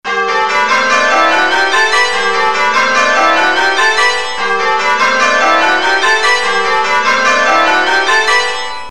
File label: Back Rounds, changeringing